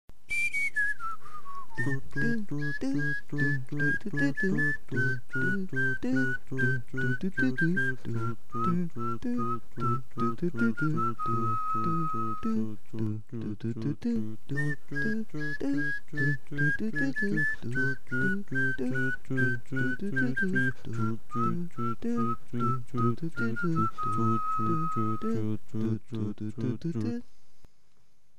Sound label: house theme; oot